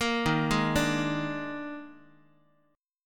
D sharp Major 7th Suspended 4th, D sharp, down, Guitar; arpeggiated; D#M7sus4; x,x,1,1,0,1; JGuitar